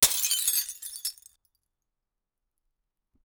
glass break

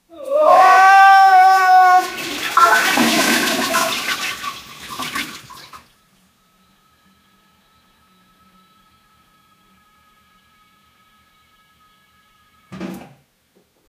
diarrhea a man screaming; awkward

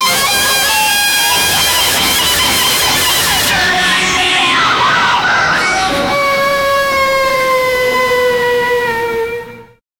sex sounds

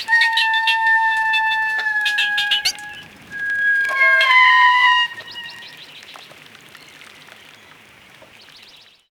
cinematic nature documentary

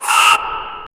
cursed undead lunge echoi

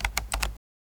mobile phone keyboard pre

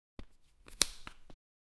the sound of putting putt, application

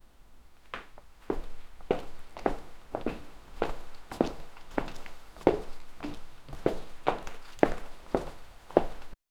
footsteps in glass shoes

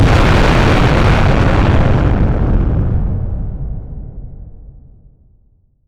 a very loud explosion fro, bomb

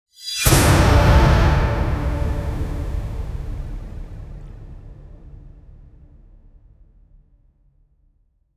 magic spell impact crysta; fighting game

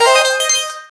regenerate effect rpg gam

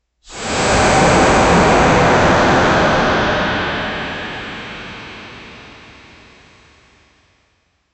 heavy gravity power that

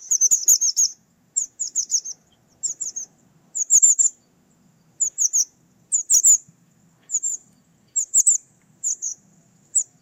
a duckling making cute; baby animal

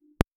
soft click sound short cr

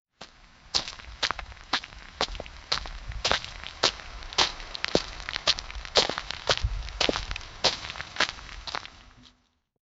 rain falling and steps on; 30 seconds

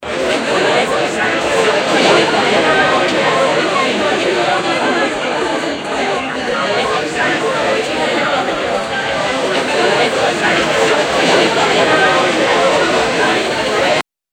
sound of paparazzi, cheering

